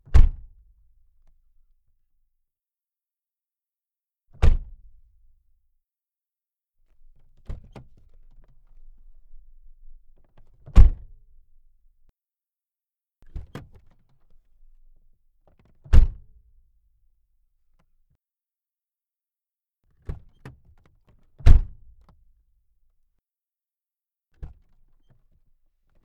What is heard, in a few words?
Door Open Close